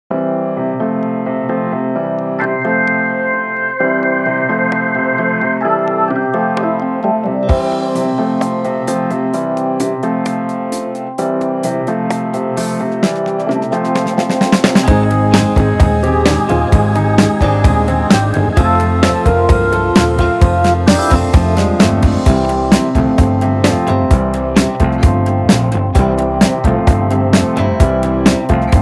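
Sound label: 120 bpm, blues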